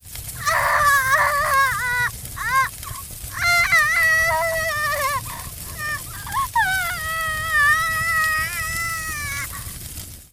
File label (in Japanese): 大火傷